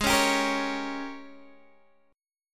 Ab Major 7th Suspended 2nd Suspended 4th Ab Guitar DADGAD